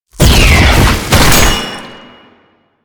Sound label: Kamikaze Flesh, Proto Infested Volatile Runner; Warframe